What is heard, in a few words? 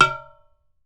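UDU FINGER, African Perc; E-MU Formula 4000 Series Vol. 4; Earth Tones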